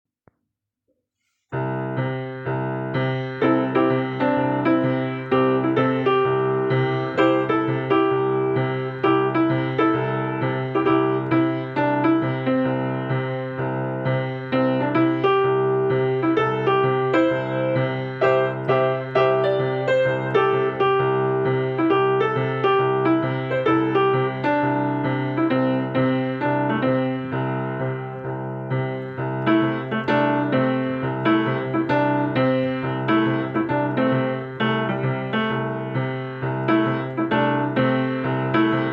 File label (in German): Bewegungsmusik zu Boomwhacker Stop and go